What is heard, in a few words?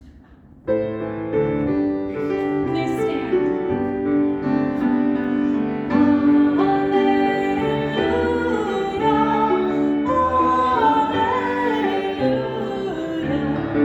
Celtic Alleluia wedding